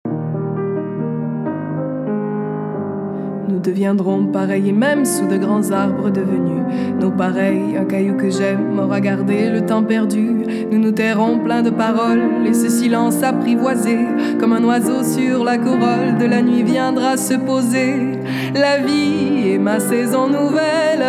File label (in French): chant, voix femmes